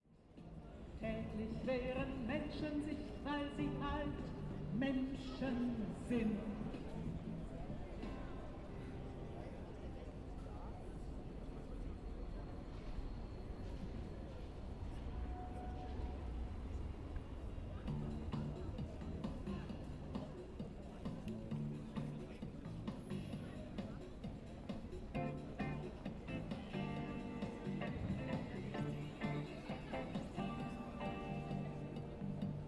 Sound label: neuer markt political rally